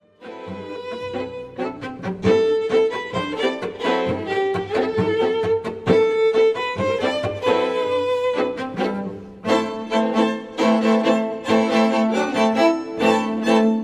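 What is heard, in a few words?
strings, All-Latin String Ensemble; classical musicians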